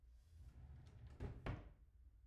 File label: drawer; IEEE AASP